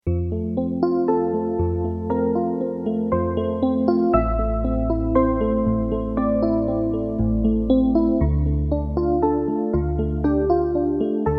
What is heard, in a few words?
supersweet e-piano